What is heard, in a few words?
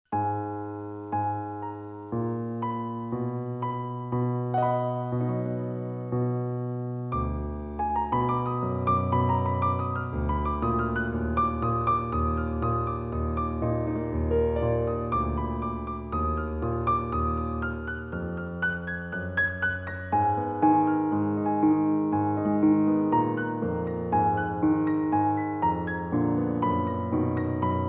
Piano